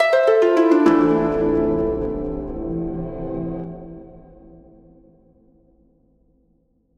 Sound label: Battle End Short; Red Hot Ricochet